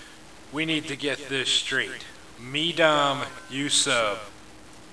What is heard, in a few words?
ahem